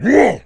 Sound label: big attack, voice